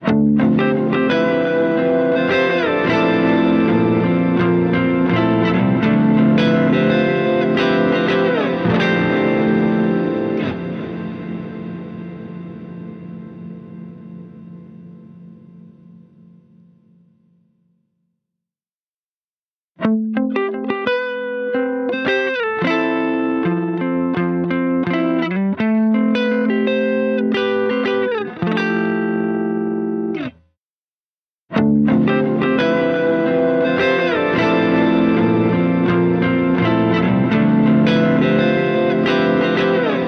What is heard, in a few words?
Guitar Polyphonic Room; H90 Programs